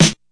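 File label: snare, Classic Hip Hop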